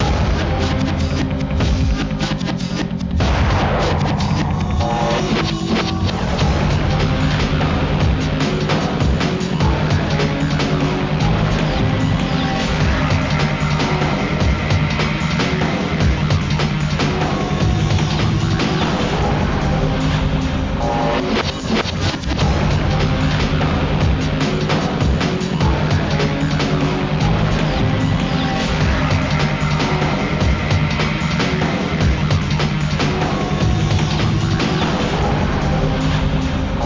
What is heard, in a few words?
Bgm Battle